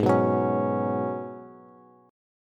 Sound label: Ab Minor 11th, Ab, Bass, Bass Standard; Abm11 strummed; JGuitar